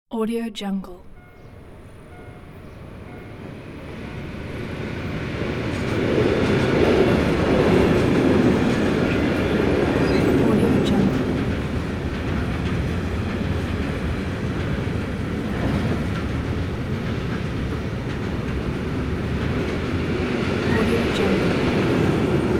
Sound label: long tank cars train pass by; urban sounds